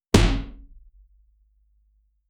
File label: room tone